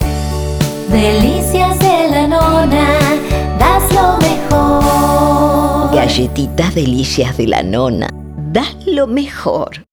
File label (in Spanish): Jingle; DELICIAS DE LA NONA